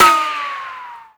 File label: ricochet, bullet impacts; Half Life Model Pack